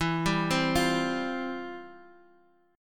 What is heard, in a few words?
C Suspended 4th E; Csus4/E arpeggiated; JGuitar